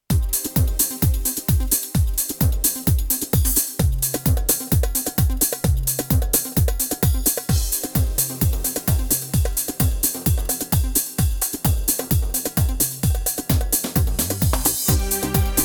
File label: turkiye pop, korg pa500 tr; tr solo enstrumanlar